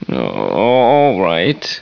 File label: use; svencoop; hunger; alfred